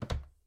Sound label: Close Tumb; Game rip; MiSide